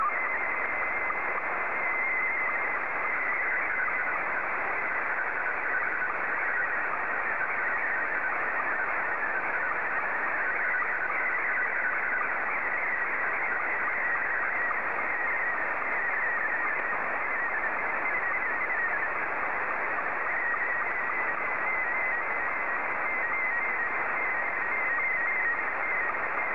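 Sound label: Scottie 2, slow-scan television; JL1FQZ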